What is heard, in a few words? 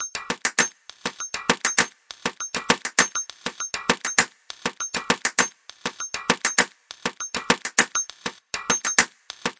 100 Found Percussion, Drum Loops and Breaks; 074 to 128 bpm